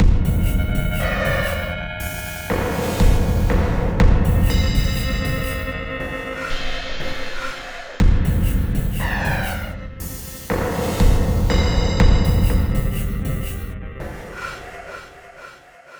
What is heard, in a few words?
Success Tonal B4; Insanely Twisted Shadow Planet